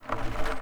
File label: Wood Stool Drag Scrape